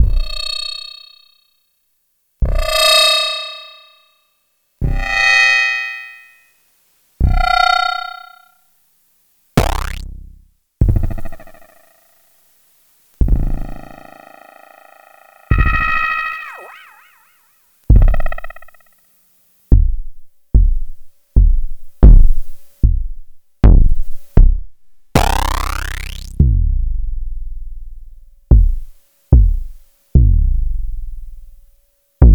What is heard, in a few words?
Kick Distorted Feedback